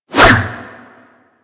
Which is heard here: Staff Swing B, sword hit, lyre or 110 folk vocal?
Staff Swing B